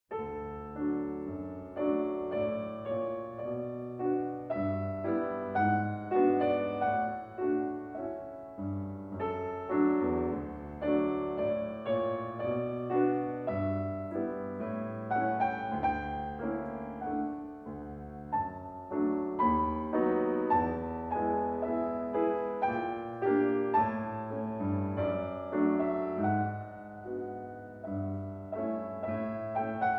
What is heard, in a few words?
Sonata for Horn and Piano - III. Moderato, e poco rubato